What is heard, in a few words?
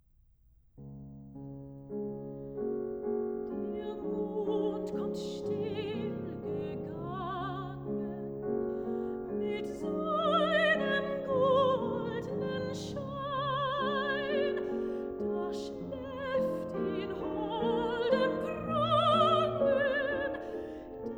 Songs for voice & piano; No 4, Der Mond kommt still gegangen; Robert & Clara Schumann